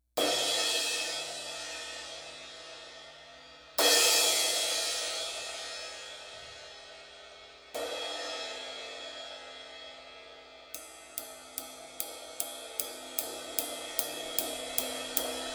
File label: Zildjian 20 A